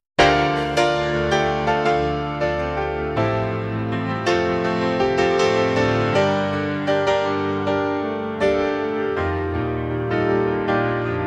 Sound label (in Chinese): 北极科考站，特雷门琴极光与地磁暴共舞(Arctic research station, theremin auroras dancing with geomagnetic stor; DiffRhythm